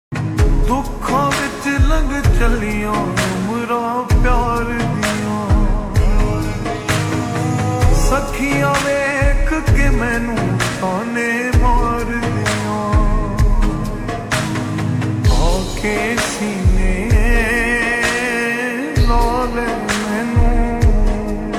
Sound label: Ringtone Slow Reverb